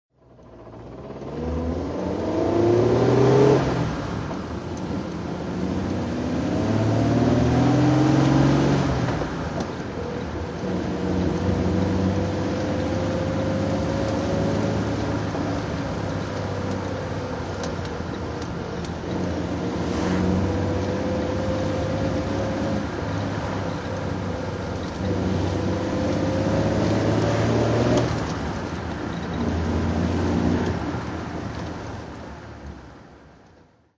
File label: bentley special drive